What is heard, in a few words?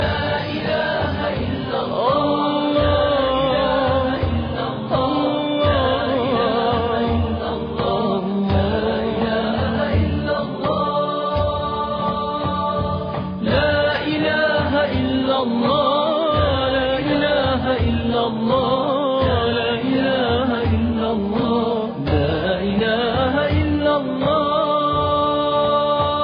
La ilaha Allah